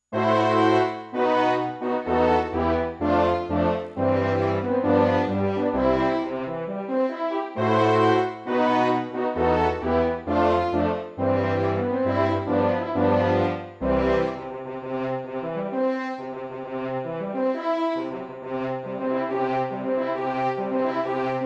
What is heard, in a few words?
wedding march horns; mendelssohn